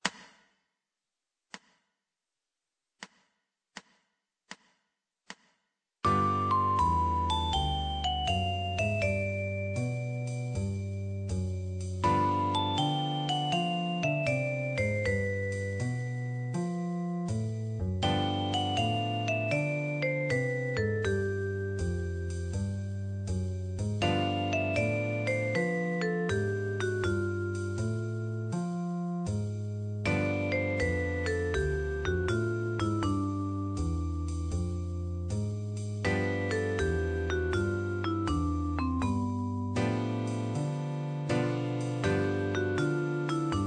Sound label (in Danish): dominant bebop ovelse